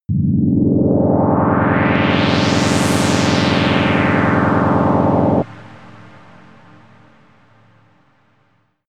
dangerous universe pad; sweeps-swells

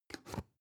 spice jar big scratch close soft; industrie wirtschaft; gastronomie

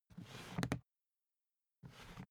briefcase plastic slide

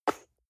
gel dispenser air suck in; haus garten

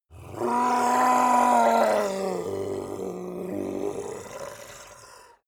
monster dark growl; kreaturen

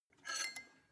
weight bolt pull out; sport freizeit

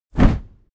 cardboardbox soft

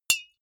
deodorant hit hard, metall; maschinen; soundpool

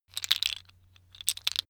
tiles wood clatter; objekte; holz

